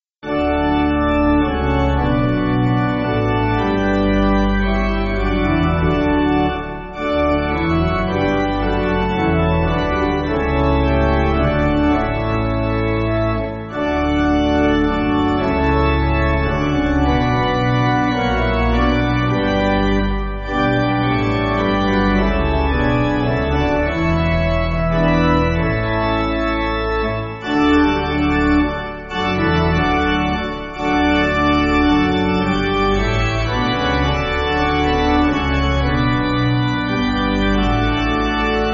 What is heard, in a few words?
Pipe